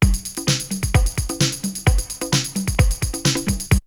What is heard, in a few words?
Drumloop; ZERO G - Datafile DISC 3